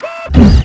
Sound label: jump; quake2; borg